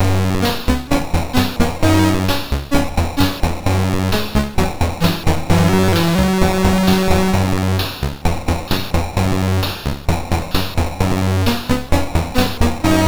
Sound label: Commodore 64 Music, MOS6581R2